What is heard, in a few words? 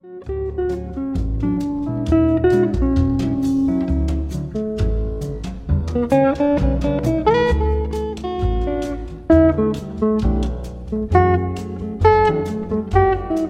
jazzy nostalgia